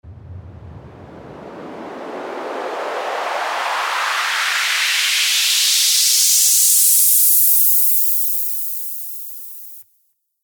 Noise

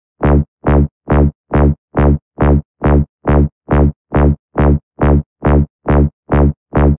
Reverse Bass Loop D (138 BPM)

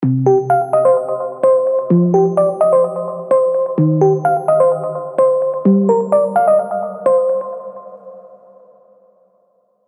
PROG ARPS Cm

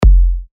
Kick F#; TECHNO; TECHNOKICKS2

